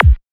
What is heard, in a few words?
Kick